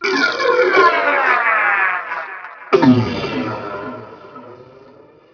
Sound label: death; Robotron; PC Gamer 39